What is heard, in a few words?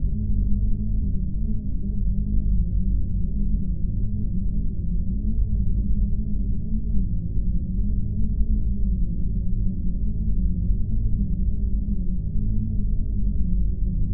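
Uneasy Suspenseful Horror Drone Atmosphere Loop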